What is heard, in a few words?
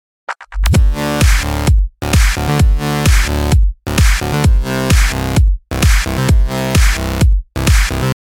electro